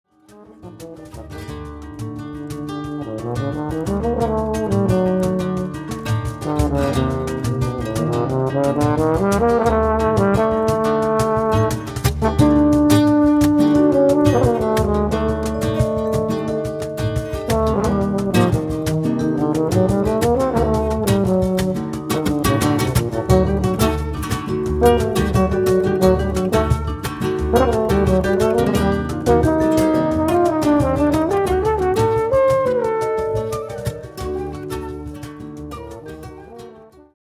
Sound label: RUMBA